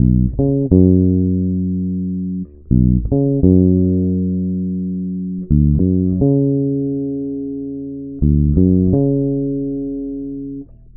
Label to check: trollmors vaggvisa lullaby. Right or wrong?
Wrong